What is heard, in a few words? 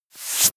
wipe